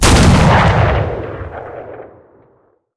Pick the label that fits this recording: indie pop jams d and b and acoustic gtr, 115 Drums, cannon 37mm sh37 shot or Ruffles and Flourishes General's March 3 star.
cannon 37mm sh37 shot